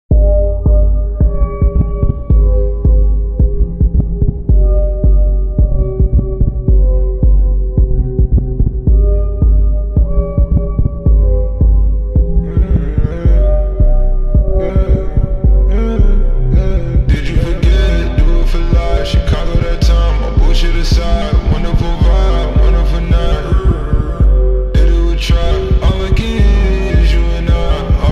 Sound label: trance slowed reverb